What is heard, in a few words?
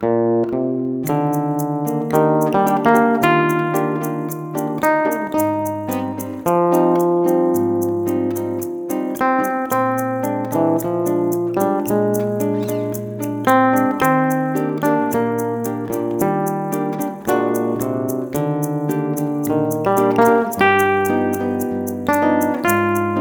Spanish Guitar, Spanish Waltz